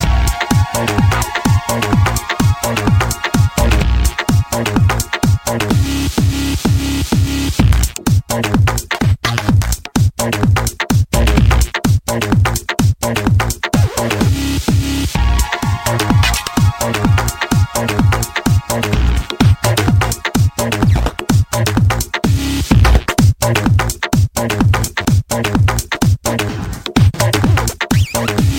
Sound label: droid turbo 2 new ringtone